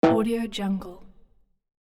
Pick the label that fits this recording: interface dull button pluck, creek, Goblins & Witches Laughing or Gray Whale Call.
interface dull button pluck